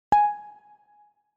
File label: message notification